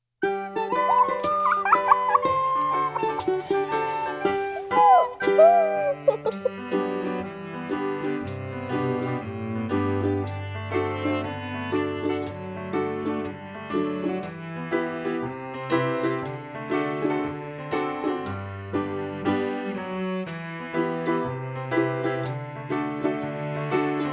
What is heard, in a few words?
instrumental